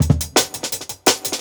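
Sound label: Jungle Breaks, 170 bpm; Burst; Original Jungle Breaks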